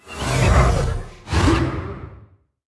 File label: verdant blast cast; heroes nyru